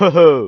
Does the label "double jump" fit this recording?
Yes